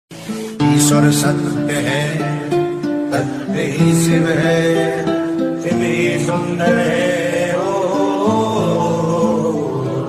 bholenath ringtone

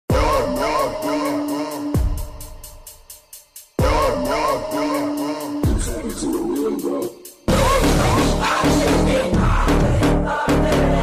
climax cloning scene bgm ringtone; thalapathy vijay

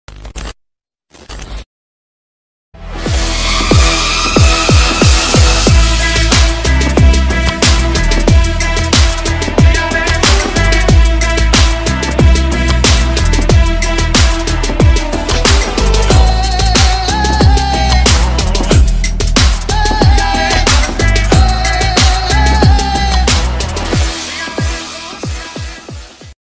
bgm remix ringtone

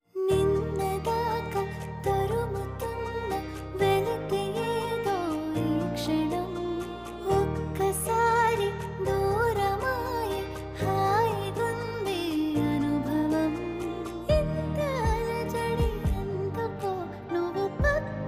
female version song; webseries

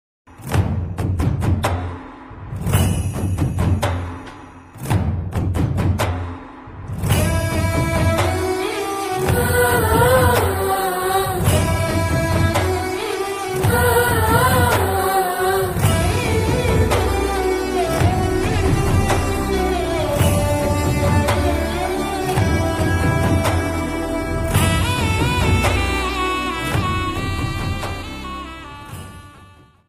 emotional bgm ringtone; jogi; shivaraj kumar

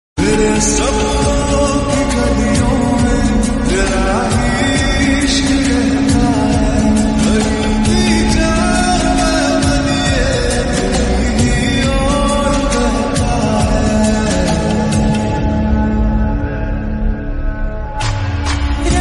lofi ringtone love ringtone

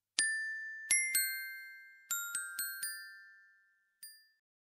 bgm ringtone tamil

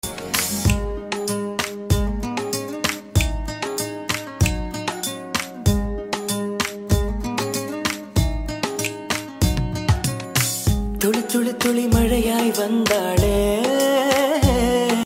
song love dance

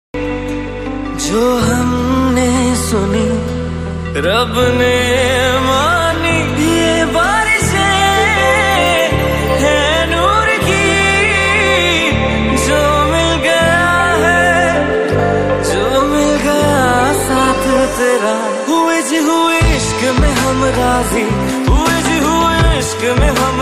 hindi song ringtone; salar

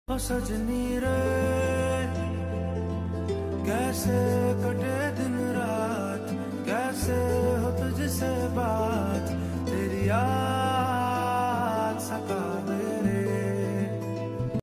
song ringtone

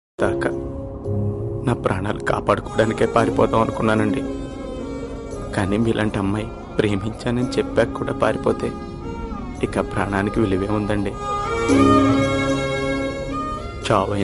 emotional bgm ringtone melody bgm; maryada ramanna

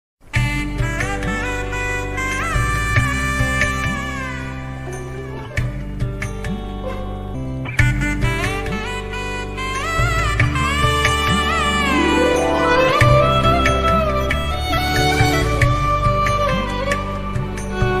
love bgm ringtone tamil bgm; vijay; bigil

